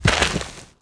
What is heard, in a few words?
donkey fall; Immortal